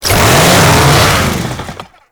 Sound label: Saw Forward Combo Rev; Warframe; Kuva Ghoul Saw